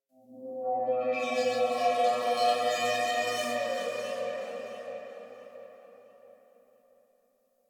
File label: Texture Interior Medium, Ambience; Sentient Lair; Warframe